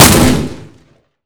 shotgun attach; weapons; ModernWarfare

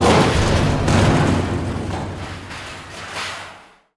explosive objects; World of Tanks

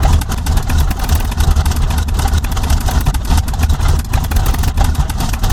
Aircrafts Engines DB605; DCS

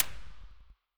akm close silenced tail; loop; Escape from Tarkov

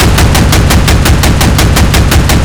Automatic 2A72, Weapons; DCS

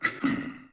Bear Fort Vox; Civ4 warlords

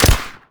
grenade launcher fire

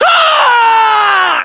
melee charge; english; voiceovers

